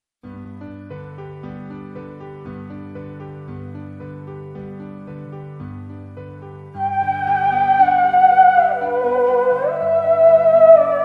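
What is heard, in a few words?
theremin; Лебедь терменвокс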